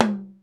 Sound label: TOM, DrumBase5000; Crypton; CFZ KIT 2